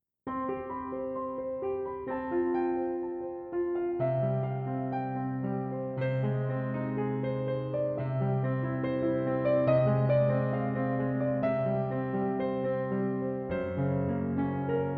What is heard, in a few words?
Piano Sketch